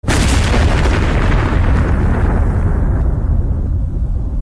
boom

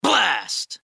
blast alert

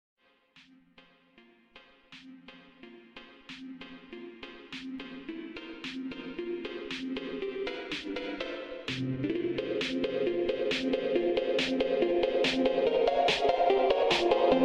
phonk

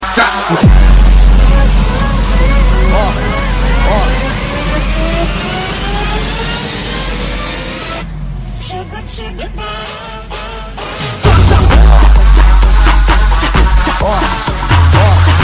funk remix

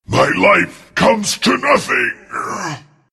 death; husk; huskar

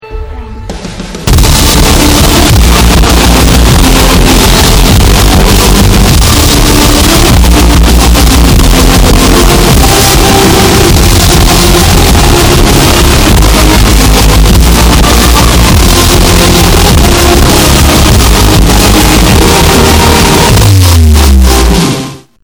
f1 opening

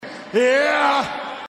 yeah chant

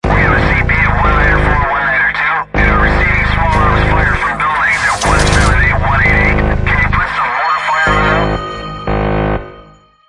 raid military; military series

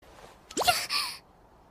cute noise; miku nakano

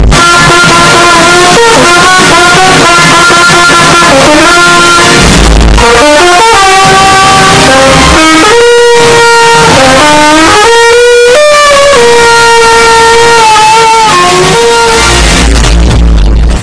earrape edition

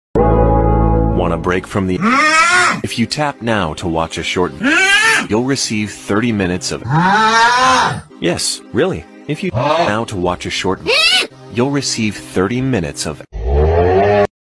aauughhhh; spotify

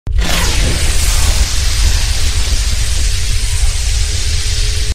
continuous electricity sound effect

